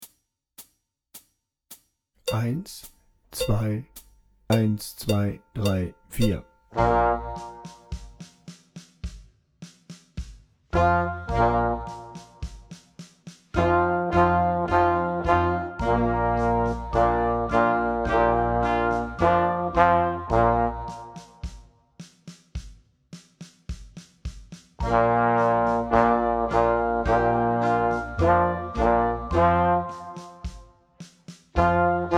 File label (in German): Rock Posaune lauter langsamer